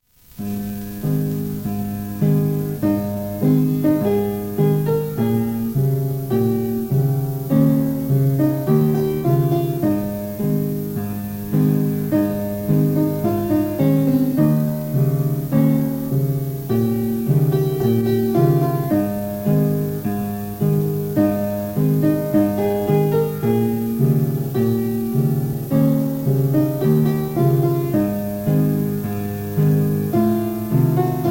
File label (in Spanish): himno colegio piano